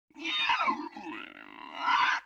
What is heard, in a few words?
LoFi Synthetic Bird Caw; Vintage Cartoon; Comedy Vocals